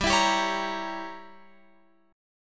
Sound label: G Diminished 7th, Guitar, Open F; Gdim7 strummed; x,14,16,14,16,17